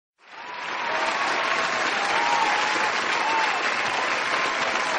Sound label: applause crowd cheering sound effect